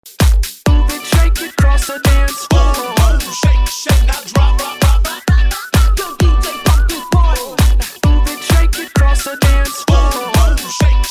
Classic Party Hype ReDrum

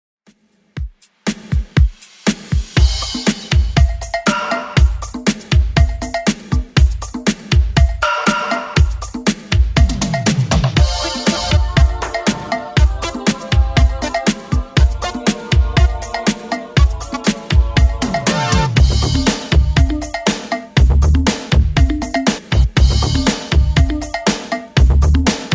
80s Dance 120bpm; Redrum

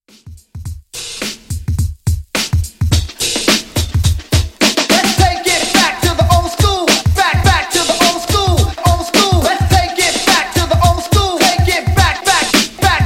Vocal Chop Intros Redrum 106Bpm

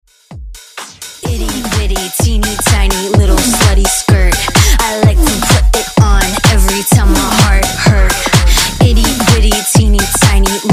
House; Redrum

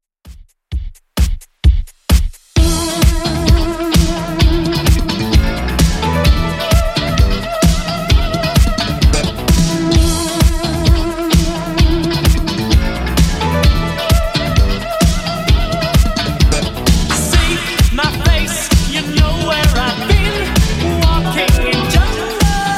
80s Redrum 130bpm

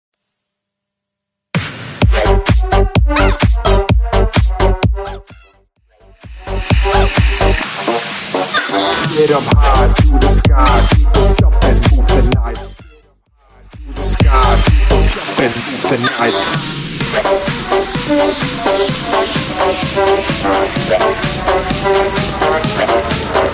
Bounce 128

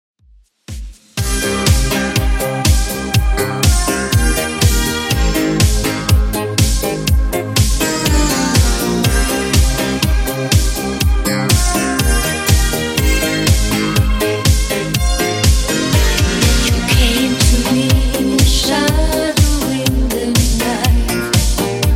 80s Redrum 122bpm

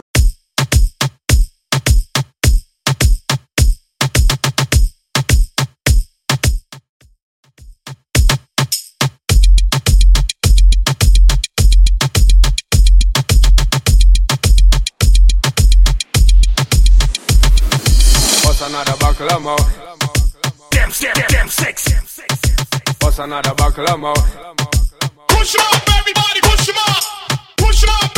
90s Moombahton

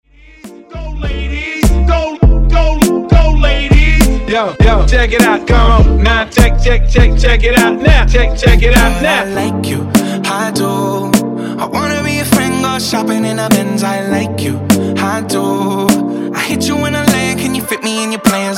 Hype Intro Outro 101 Bpm

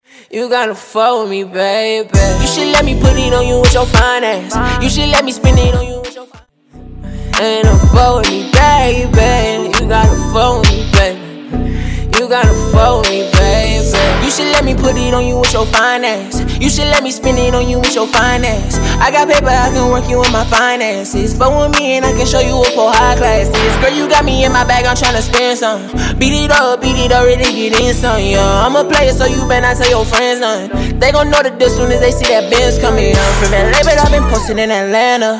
Acapella In Out

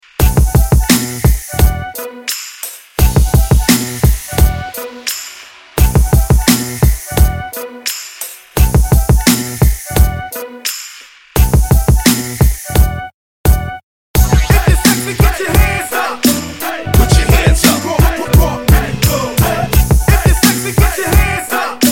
Hype Intro

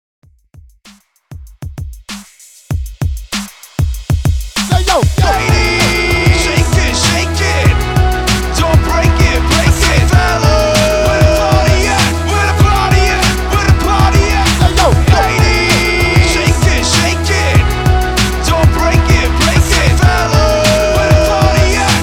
HYPE 97 BPM; REDRUM

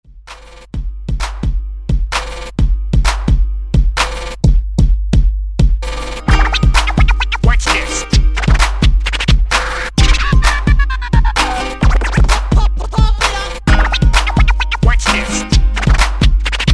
Breaks Hype Scratch 130 Bpm; DJDOLLS

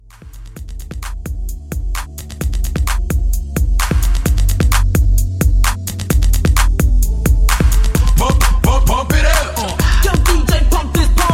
90s Hype 130

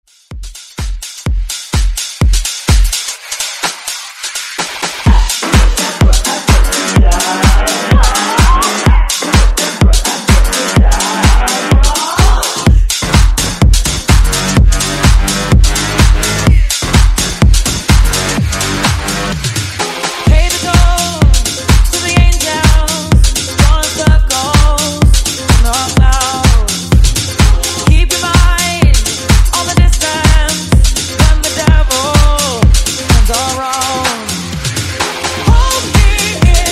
Dance Redrum

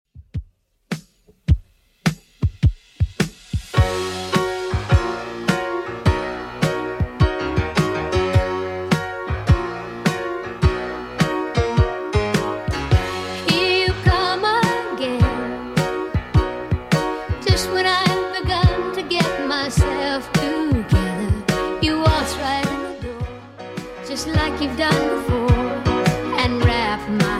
70s Redrum 105bpm

